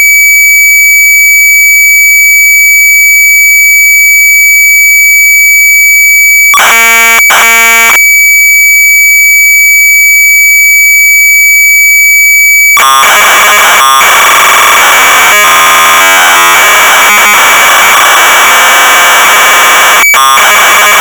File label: COMPUTER ORGAN